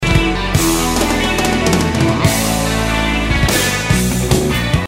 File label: Drum Sounds, Fill